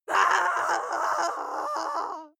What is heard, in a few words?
female lunatic scream pain long; schreie; menschen